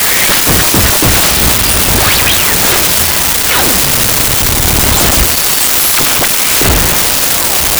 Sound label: starter; Sound Effects Library (28Cds)